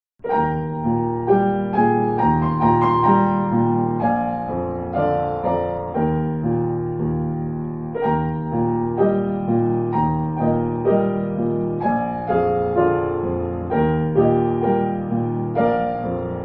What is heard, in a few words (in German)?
klingeling, trompete